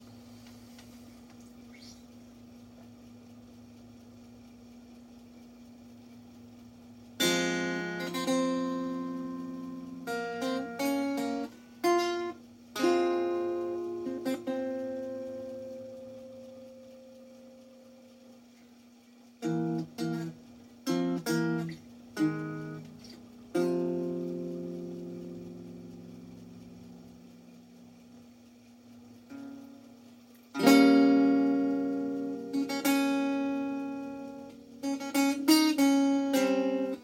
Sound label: banjo